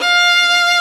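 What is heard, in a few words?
Vio legato F4; Digital Sound Factory Virtuoso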